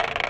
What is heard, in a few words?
contact perc